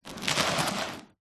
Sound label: household book thick old short slide on concrete surface; zapsplat